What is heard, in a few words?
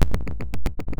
beat